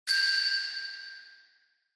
Single Accent High

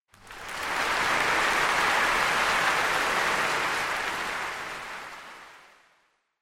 Applause Crowd Short

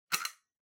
Pendant Light Pull

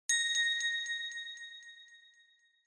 Bell Accent, Low Delay

